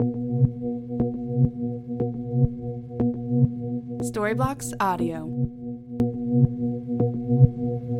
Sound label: Ambient House Synth Bass 120BPM C